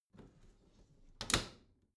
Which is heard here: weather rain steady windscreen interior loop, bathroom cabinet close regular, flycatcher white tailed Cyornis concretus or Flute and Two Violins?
bathroom cabinet close regular